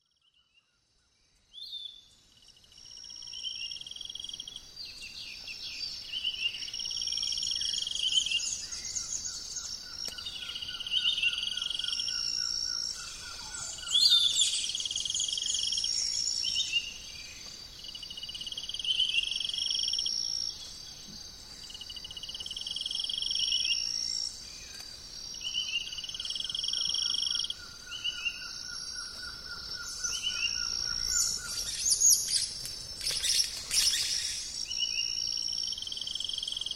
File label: Day volcano Arenal, Birds in the Rainforest; naturescapes